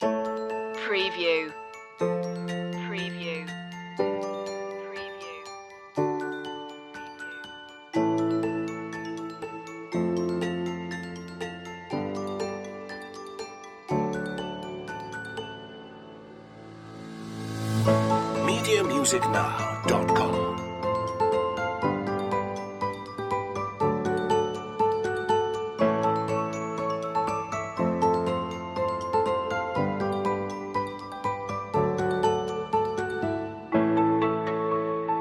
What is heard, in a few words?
bell shoppe